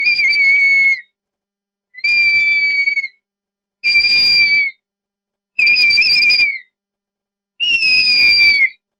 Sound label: Coopers Hawk